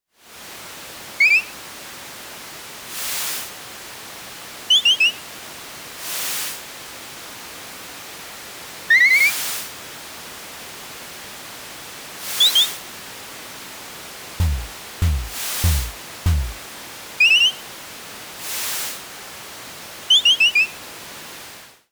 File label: park birds soft